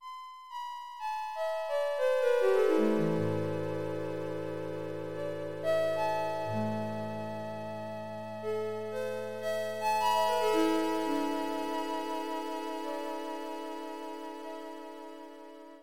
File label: Nice Alarm Sound